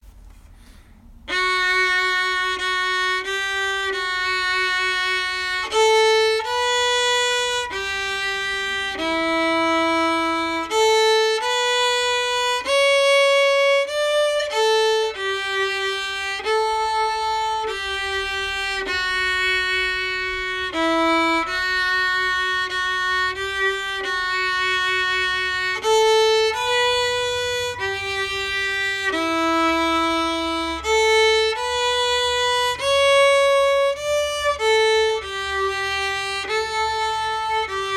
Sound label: montague processional countermelody, fiddle